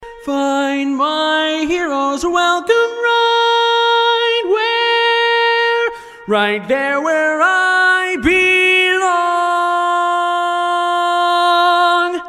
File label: Tenor, barbershop tag